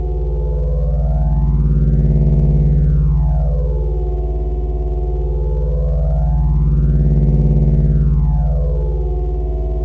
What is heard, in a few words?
amb fuelpump; Star Wars Knights Of The Old Republic II; XBOX